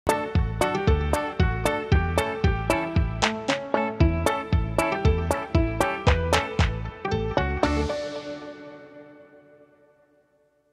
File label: happy media music opener